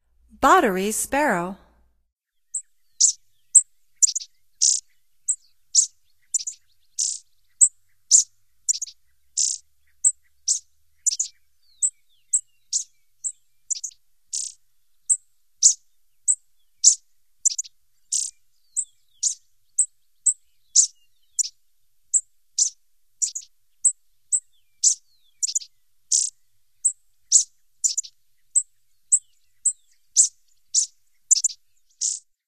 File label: Botteris Sparrow, Songs of Birds of North America; Stokes